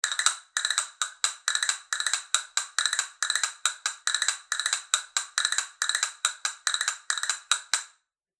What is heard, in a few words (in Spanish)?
castañuelas madera gris marron veteada